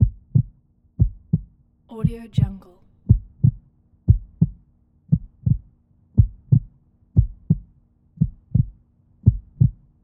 heart beat

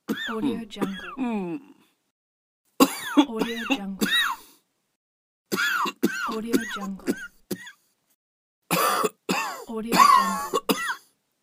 cough

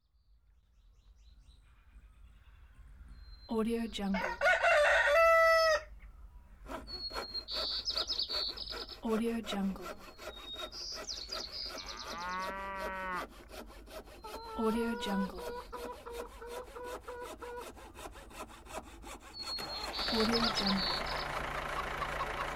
village ambience

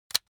object household razor rickety fold up hard

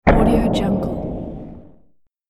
loud door boom

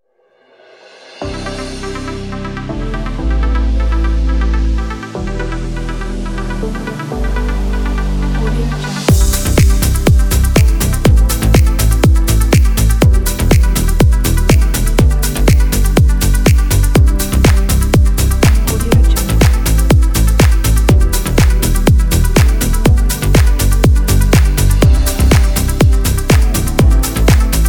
ambient punchy pop

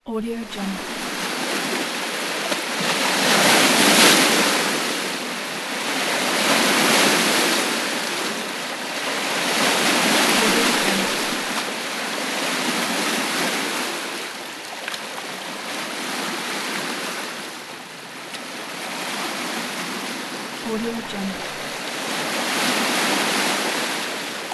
ocean waves; fx-market

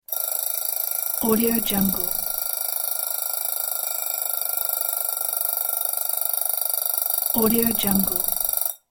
old fashioned alarm clock

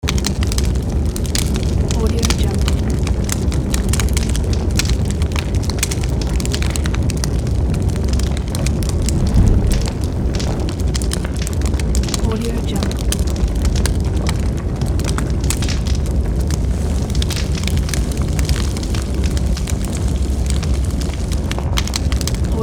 fire

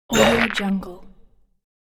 stone troll hit

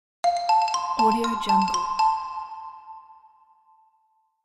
embarrassment

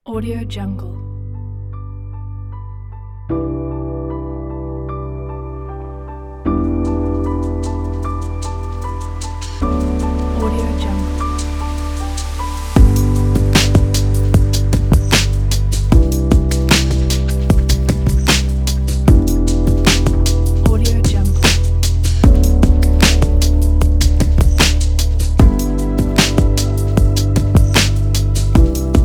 ambient chill hop

